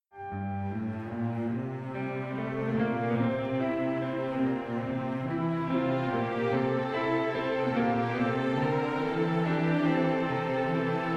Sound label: guitar